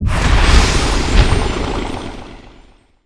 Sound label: wild Expanding; netent